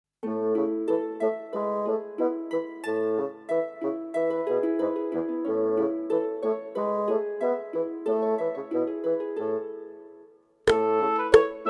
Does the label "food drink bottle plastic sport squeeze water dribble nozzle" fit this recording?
No